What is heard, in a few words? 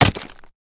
pickaxe hit